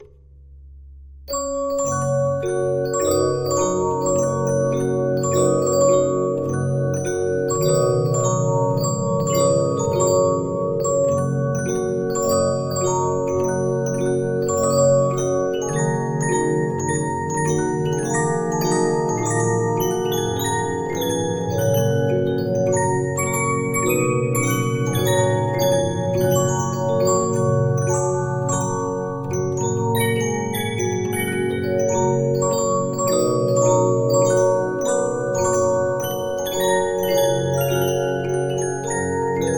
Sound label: Regina, 15inch disc musicbox